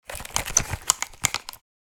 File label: Headphones handling putting on; headphones and speakers